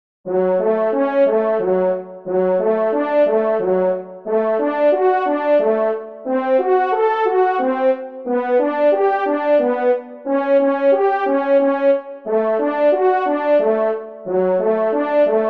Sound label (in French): Quatuor, cor; Schubert Ave Maria